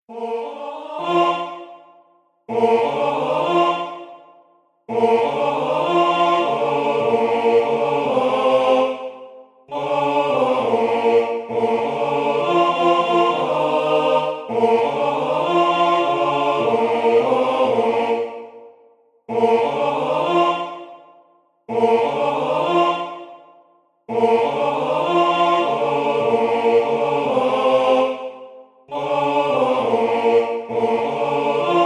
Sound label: MIDI SATB Lead